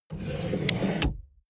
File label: plastic drawer large draw